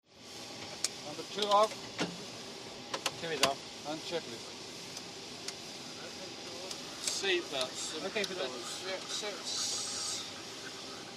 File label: 707 Cockpit Engines, interior perspective